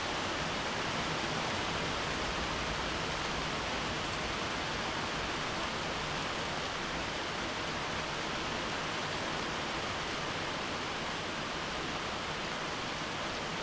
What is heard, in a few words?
outdoor mountain road day rain heavy; anemoi